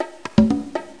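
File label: djembe; MOBICLIC 14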